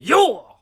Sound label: vocals shouts "yoh" solo low; TKO